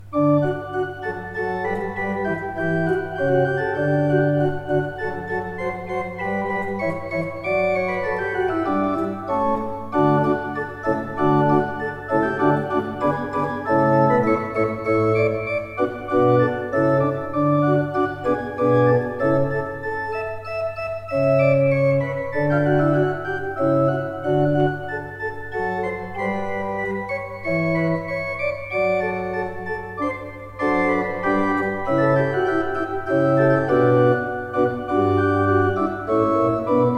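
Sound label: Positiv, organ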